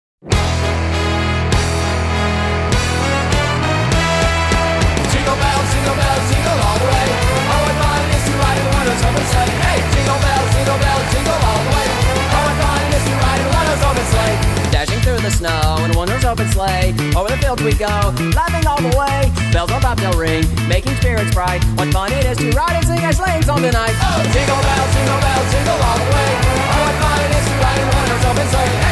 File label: Punk Ska Covers Jingle Bells Ska